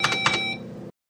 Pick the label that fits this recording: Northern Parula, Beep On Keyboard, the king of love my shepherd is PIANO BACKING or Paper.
Beep On Keyboard